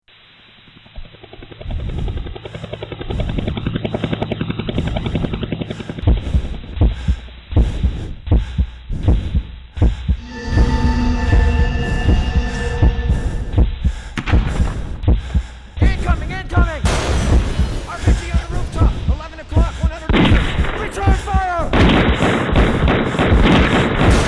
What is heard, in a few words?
War Firefight; Foley